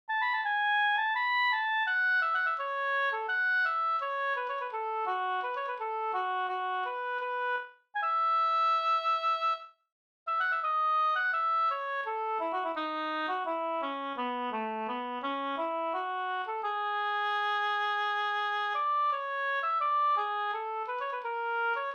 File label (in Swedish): vals A D dur; En afton pa Oljaren